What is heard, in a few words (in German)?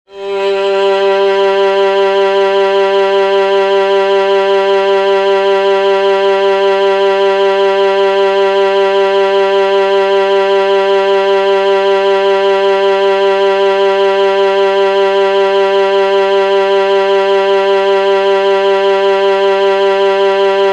800Hz Violine